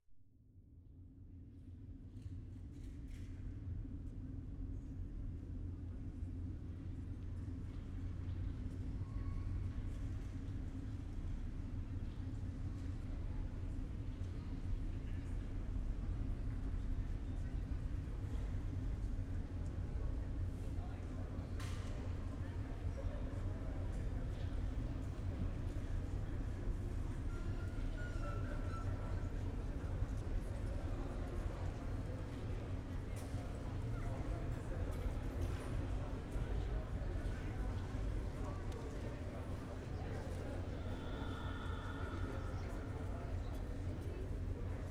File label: Iron Ring Public Area, soundscape; Death in Space